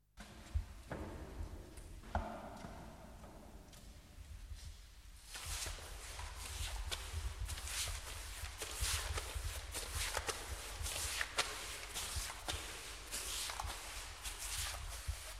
PENNELLATE VERNICIARE

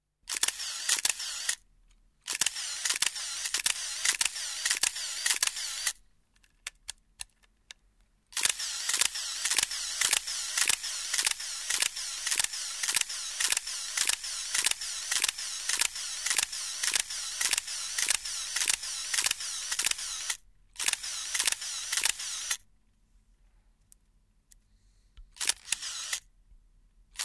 MACCHINA FOTOGRAFICA SCATTO

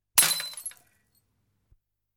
VETRO ROTTO BICCHIERE

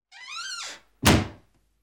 PORTA CHIUSA SBATTUTA

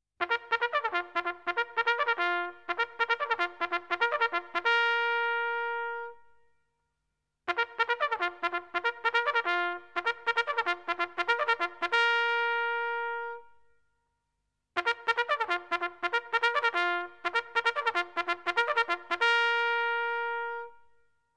TROMBA MILITARE

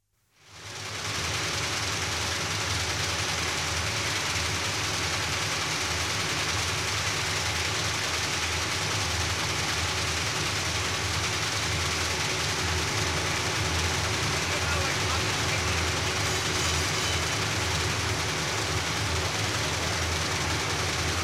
OFFICINA FABBRICA

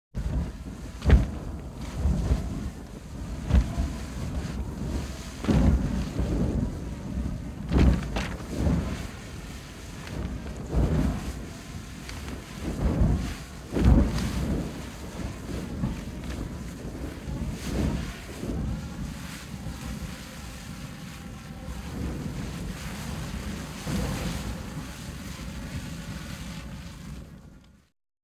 FUOCO VENTO

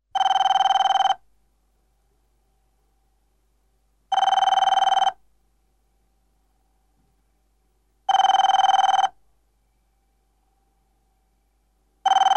SQUILLO TELEFONO NUOVO

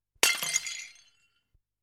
VETRO COCCI ROTTI

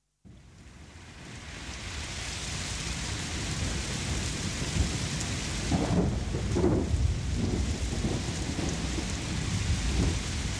TEMPORALE PIOGGIA

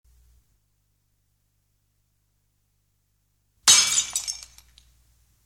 VETRO ROTTO

